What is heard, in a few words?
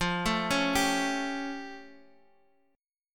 A Augmented 7th F